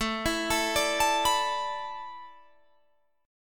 A Suspended 2nd Suspended 4th A Guitar Open G, chord